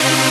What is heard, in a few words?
Synths Root C